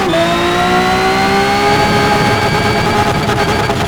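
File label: third, car engine; sgmcars; veneno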